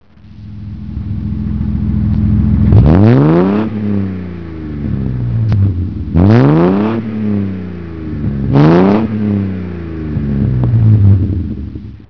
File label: healey 3000